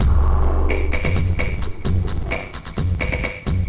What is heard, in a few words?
Octave Down Loop 130BPM, DrumLoop Ghost Layers